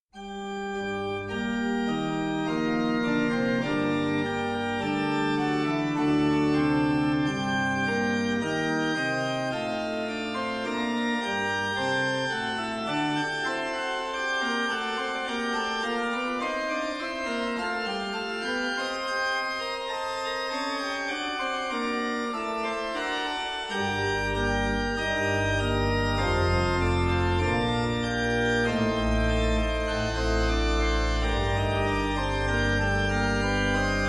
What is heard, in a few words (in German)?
Instrumental, Blechblaeserensemble; CVJM-Posaunendie